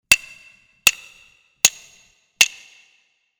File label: kobyoushi